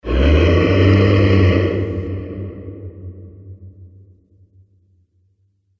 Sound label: Fire Breathing Monster; Monster Sound Effects